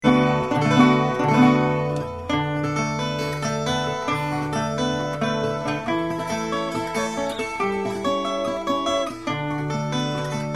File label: portugal lisboa fado